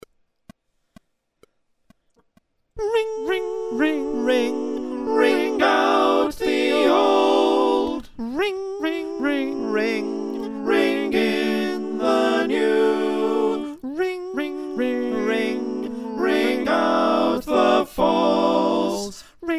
SATB, choir

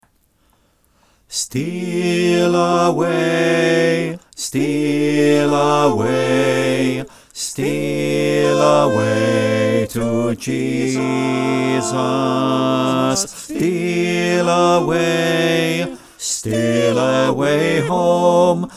bas; Steal Away

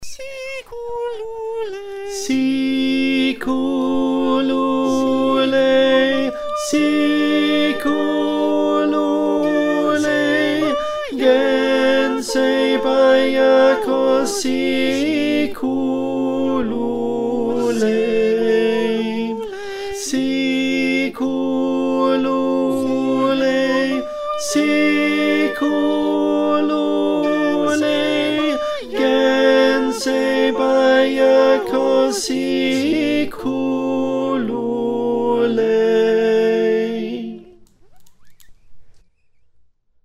Tenor

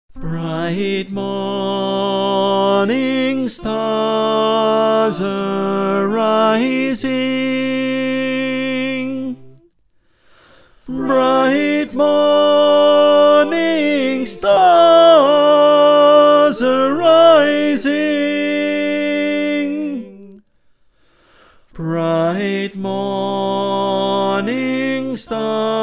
Tenor

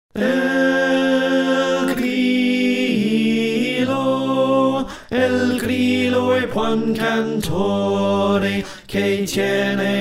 Slow Tenor

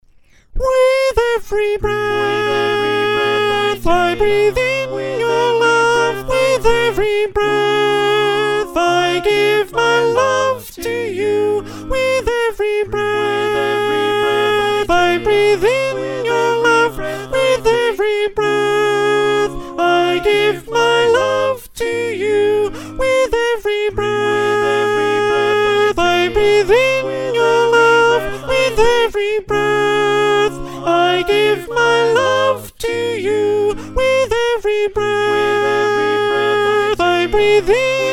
Soprano, choir

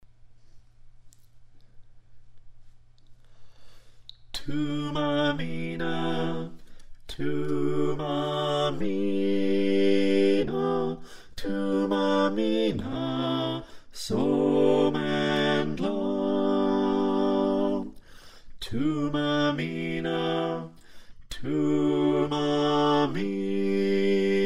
SATB

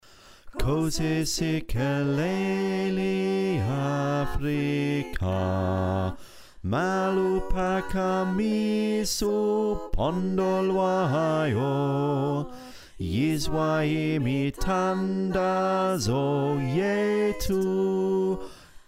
Bass, choir

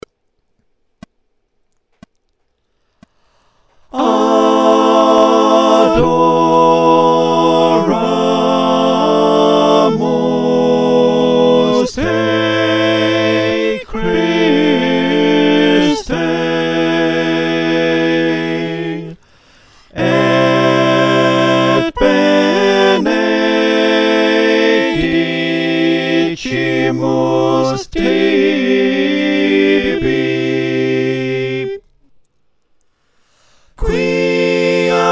Adoramus Te Christe - SATB

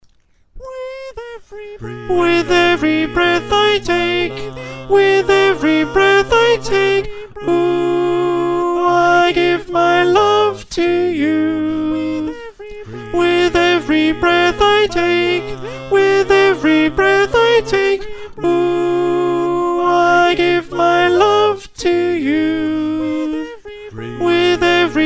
Alto, choir

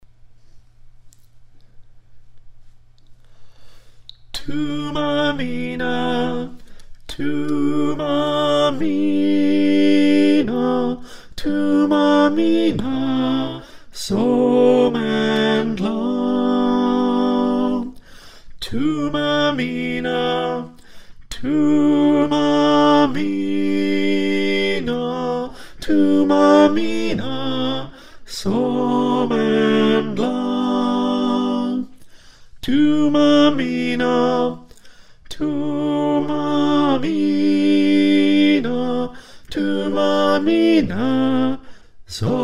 Tenor

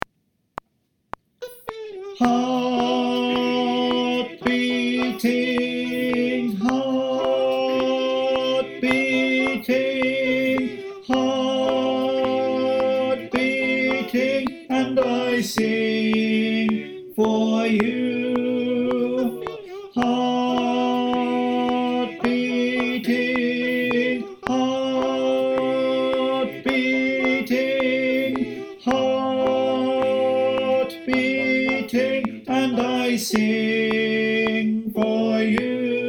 tenor click

